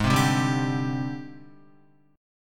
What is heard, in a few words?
G sharp Major 7th Flat 5th Guitar; 3,3,0,0,2,3; JGuitar